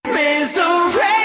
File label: djent vocals